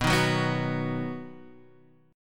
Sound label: B Major, Guitar, Half Step Down